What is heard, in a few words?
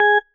marimba tissue; ゆめ2っき